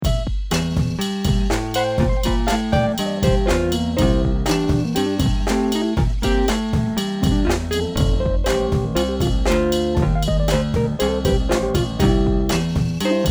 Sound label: MIDI blues